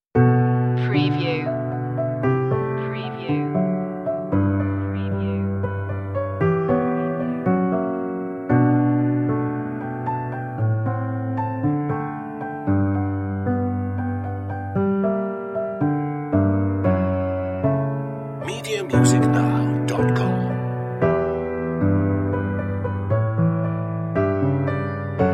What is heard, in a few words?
sweet piano